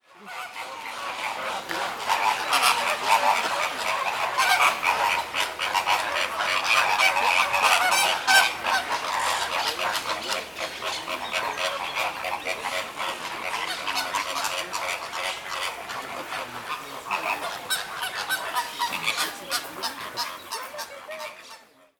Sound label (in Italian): fenicottero; Phoenicopterus roseus; Greater Flamingo; Phoenicopteriformes; Phoenicopteridae; call; cattività; stormo in volo a Venezia